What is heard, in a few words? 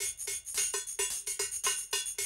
110, Classic Drum Loops